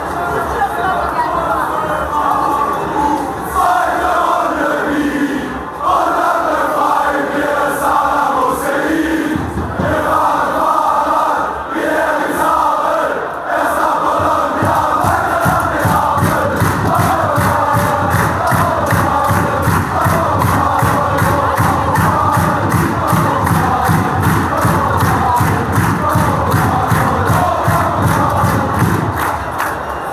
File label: chant, fan chants; eintracht frankfurt